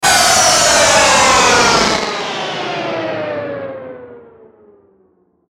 Static Metallic Descend, Static Filter Flange Tape Rewind; Production Element Imaging Element Accent Transition; Blastwave; Static Sound Effects